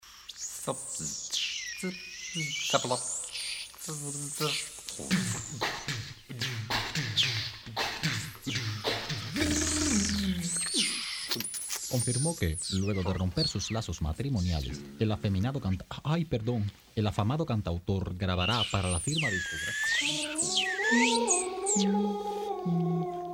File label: vocals, voix ethniques